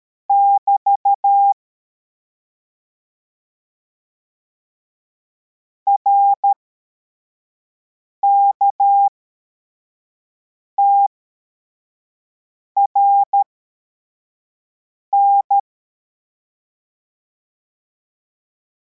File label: cw, morse